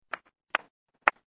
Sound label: contemptuous clap